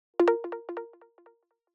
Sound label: app and menu alert or notification subtle panning clean tone; price alerts; airborne sound